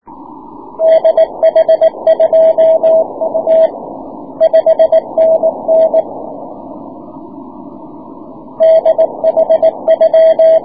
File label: 6m cw